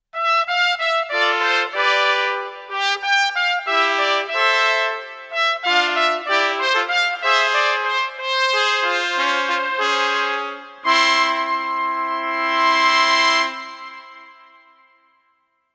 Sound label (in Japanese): トランペット ver.